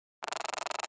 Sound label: Digital High Chirp, computers